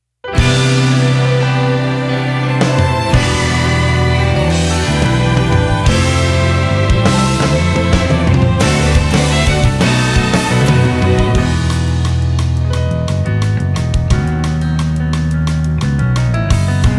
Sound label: inst